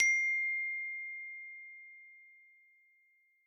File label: note quiet, message Received Sounds; Session iOS